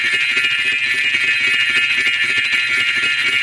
points count; 摇钱树

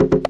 granary; 帝国时代+罗马复兴

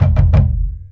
archery; 帝国时代之罗马复兴

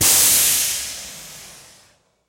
activation manure; 摇钱树; ITEMS

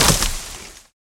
branch fall; 摇钱树; TREE

